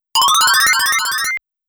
Coin; snk arcade sound digital collection vol. 01

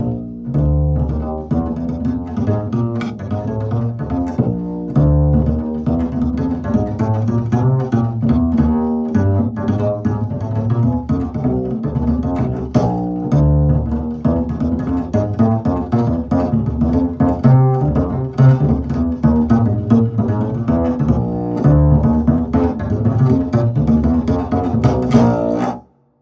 subway play for tips bass; The Sims 3; Windows

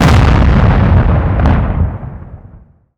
EXPLOSION Distorted Long stereo

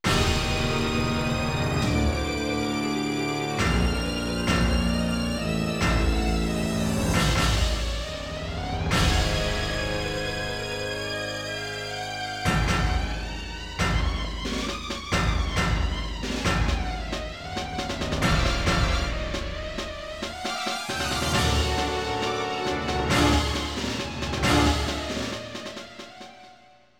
Deep Sea Ending

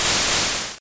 Poison Powder; sfx; attack moves; pokemon; gen 1; rby

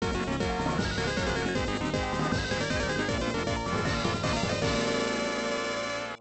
Bonus Victory; Telepachi Fever Lion; arcade gamerip 1996

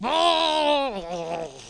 gargle; super trench attack

